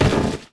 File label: stone bounce